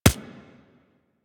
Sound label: Weapon Punch Pistol; Warframe; Weapons; Pistols